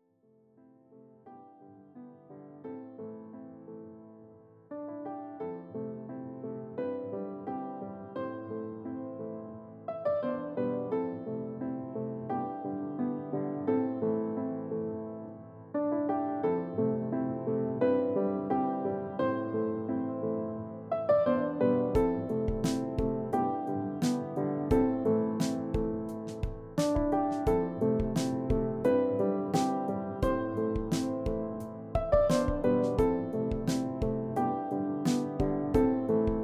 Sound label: Ambient Ambiance; Inner Depth